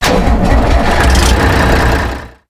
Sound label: siege start sound